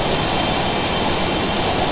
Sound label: vtol move